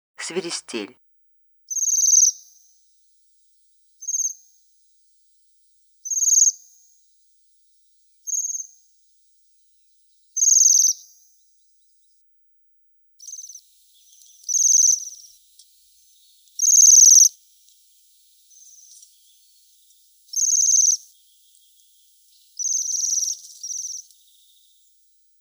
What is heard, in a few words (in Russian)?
zvuk sviristelja